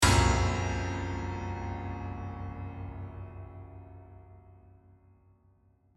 Horror piano chord crash piano lid haunted

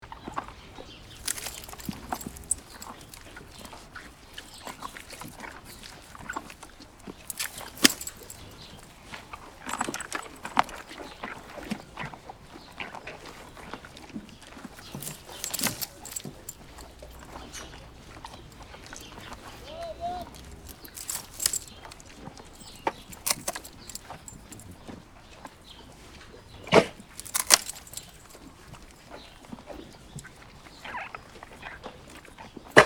Quiet farm stable ambience loop

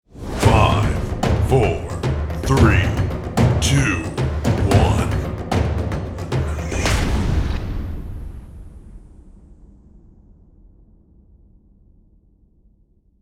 Cinematic countdown

